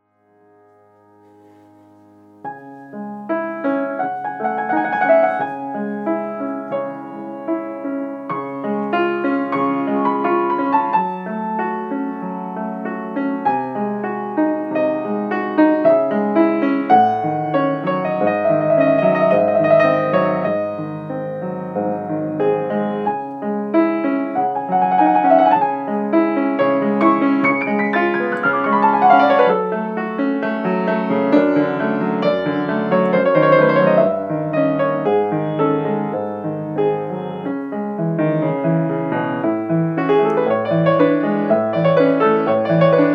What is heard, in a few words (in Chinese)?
钢琴录音